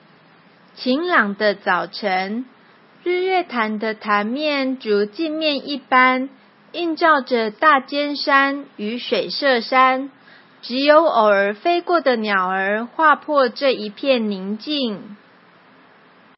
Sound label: music